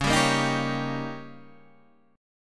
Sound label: Eb 7th